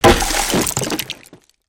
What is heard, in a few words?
hazmat smashable, destructibles